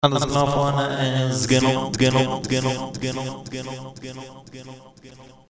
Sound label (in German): Jingels